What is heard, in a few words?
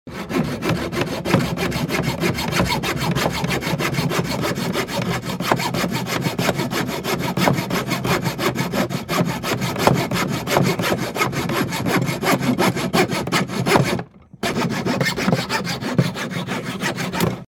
sawing wood